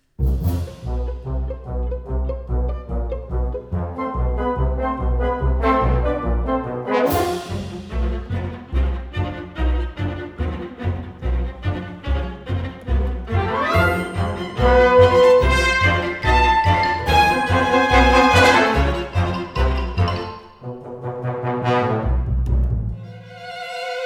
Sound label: live orchestra carnival ride